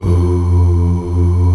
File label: F1 MALE OO; Northstar - New Gold VOL-2